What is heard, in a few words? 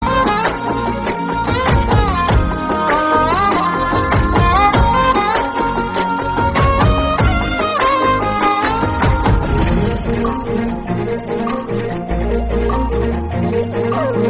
Heart Touching Telugu BGM